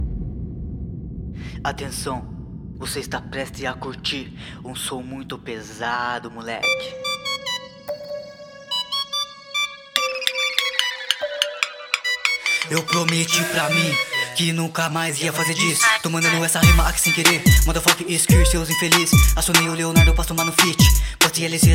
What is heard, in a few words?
banking trap